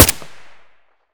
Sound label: ak74u silencer stereo; STALKER; IWP